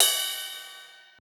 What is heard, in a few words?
Ride; FLPRO